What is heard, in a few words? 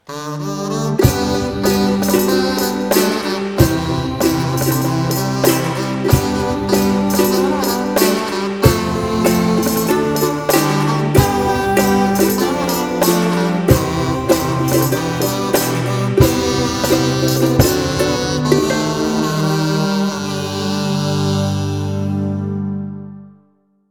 Two Kazoo